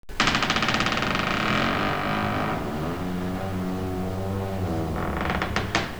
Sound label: force creak; firearms